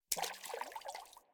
Water Hit

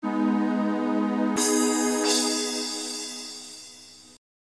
Start Battle!; bombaman extra ammo msx2

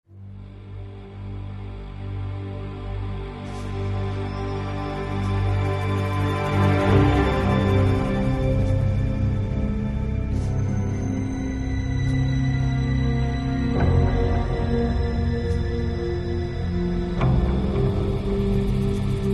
Loop Tense

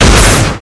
attack; guns n zombies 2014 pc

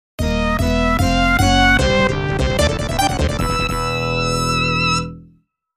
Level Clear; Hot-B

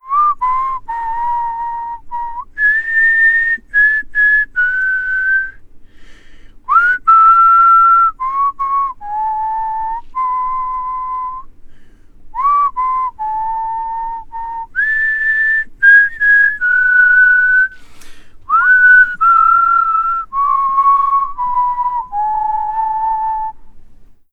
whistle; the 39 steps

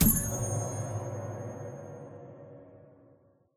collectable collect sound; Poppy Playtime

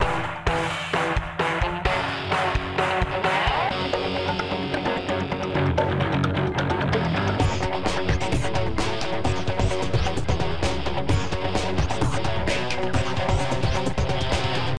Action START

